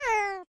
cat hurt; animalia

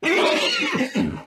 horse hurt; animalia

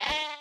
sheep hurt; animalia

